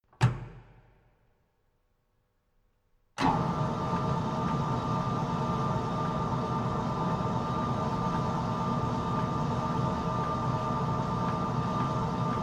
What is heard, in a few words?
Industrial Mechanical Large Conveyor On Start Run Stop; Switch; Conveyor Belt